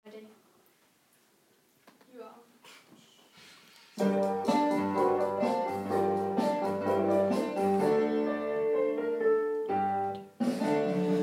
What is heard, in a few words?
clarinet stripy cat